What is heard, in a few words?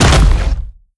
step down, creatures strider; seq passby